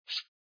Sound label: dispatch card